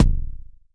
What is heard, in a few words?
Noisy bd, Jomox Airbase99; Drum Machines